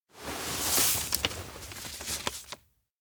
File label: take grandpa letter open; koyomi; anemoi パッケージ版